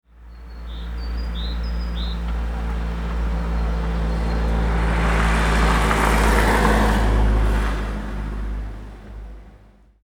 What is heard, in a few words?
Passing Car